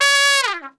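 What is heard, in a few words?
C#3 TRP SHF, Trumpet; Best Service; Brass Super Section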